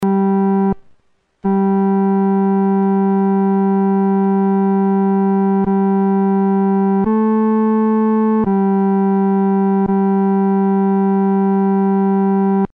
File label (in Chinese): 阿门颂 独奏（第三声）